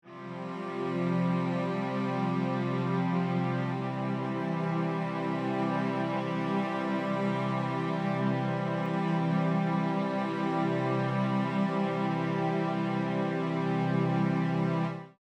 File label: C Resolved Chord, String Ensemble